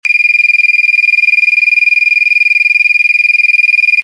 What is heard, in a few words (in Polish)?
Ton